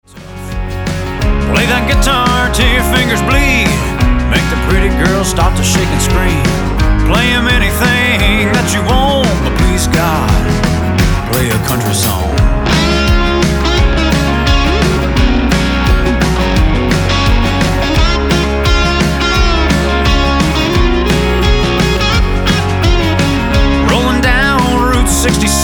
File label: country song country